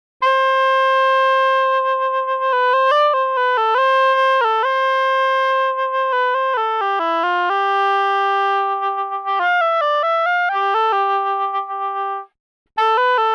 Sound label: oboe